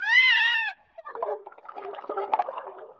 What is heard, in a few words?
beaver cry underwater with bubbles surroundings